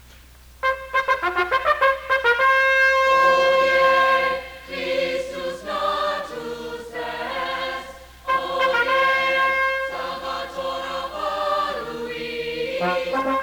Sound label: Fanfare for Christmas; Samohi